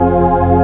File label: CHOIR STR; Groovy Bytes: Behind the Moon